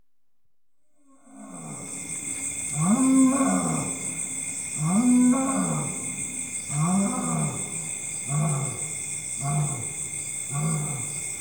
Lions, Lions With Insect Chorus, Africa, Natural World; BBC Sound Effects Library